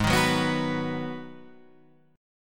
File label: Ab Minor 7th down Guitar Half Step Down